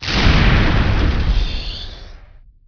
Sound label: flame turret; Emperor - Battle for Dune